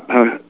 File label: Podcast Cough